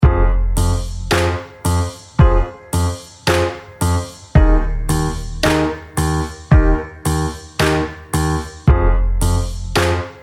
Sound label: round ten sec count, music